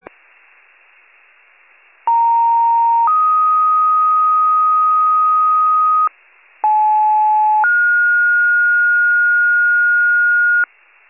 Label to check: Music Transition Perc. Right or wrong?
Wrong